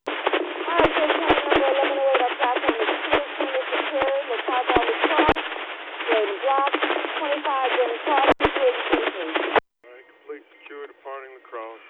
Amtrak; La Crosse

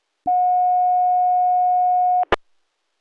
Tone

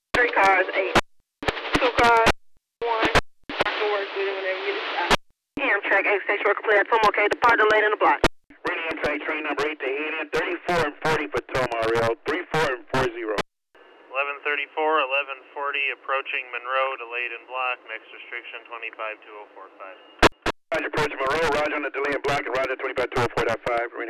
Amtrak

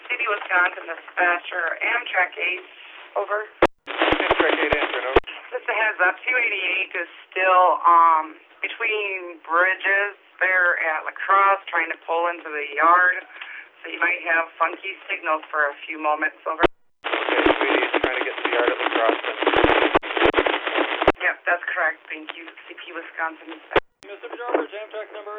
Amtrak

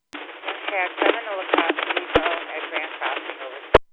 Grand Crossing, Amtrak; Lynn

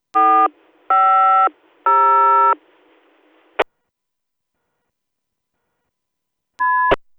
Tone up

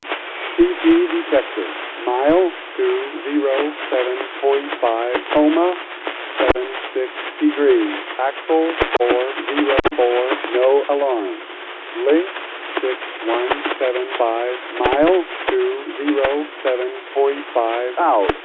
Defect Detectors; CP Tomah Sub MP 207.5

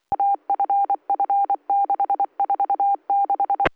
Morse Code

